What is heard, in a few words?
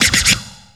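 scratch fx right; Big Beat Samples & More; AKAI; SHORTFX KIT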